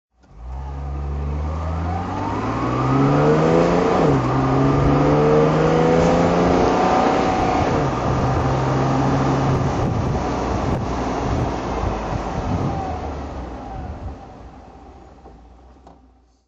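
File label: mercedes-benz 280sl auto drive; Mercedes-Benz 280 SL 'Pagoda', 1970; Engine sound driving; V3D/ ClassicarImages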